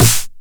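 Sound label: 909 SD Tape, snare drum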